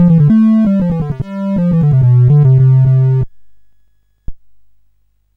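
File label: Pirati, MOS 8580 SID chip music, games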